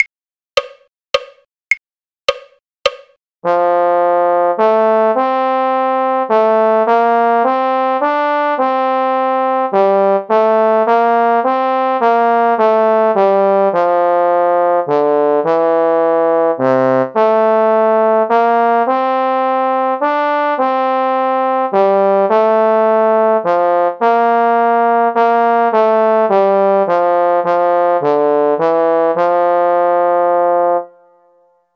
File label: trombone; carols